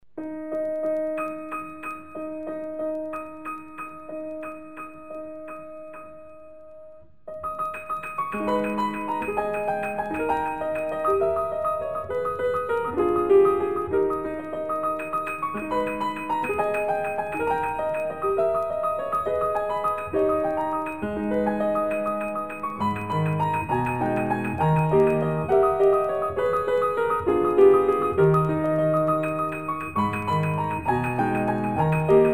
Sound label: La campanella etudes piano Transcendental